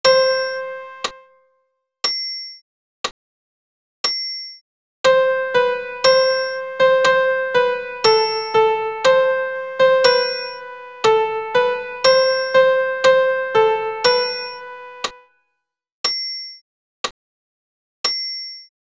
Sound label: Et in spiritum sop pian seul